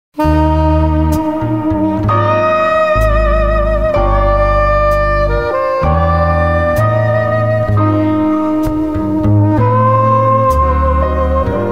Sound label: Quartet Trio; Cover Band